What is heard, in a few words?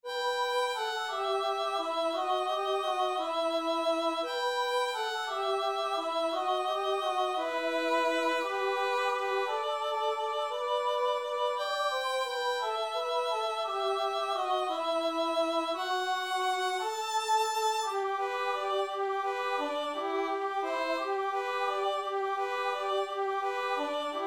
Violin Descants; We Three Kings